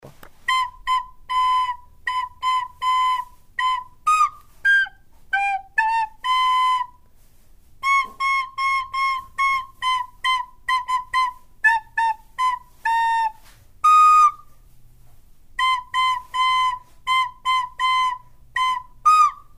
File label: Jingle Bells, recorder